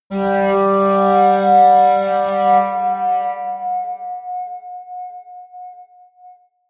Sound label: atmospheric feedback synthesizer medium, drones